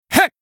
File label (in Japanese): 攻撃短; ビンセント